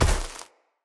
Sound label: Step Veg, Footsteps; Enemy; Corrupted Sproutfolk